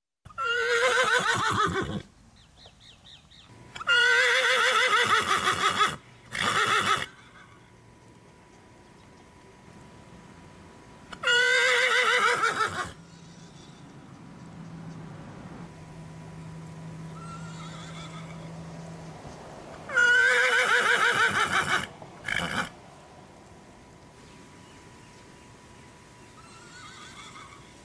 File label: horses neighing; animals